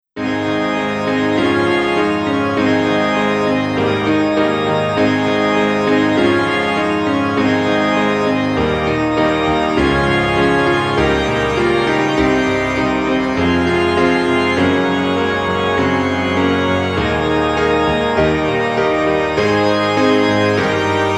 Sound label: Piano Organ; Celebrating Grace; PIANO & ORGAN HYMN ACCOMPANIMENT DEMOS